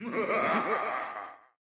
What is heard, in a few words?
idle, evocation illager; PureBDcraft; minecraft